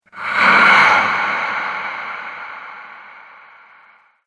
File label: Ghost Breath, scary breathing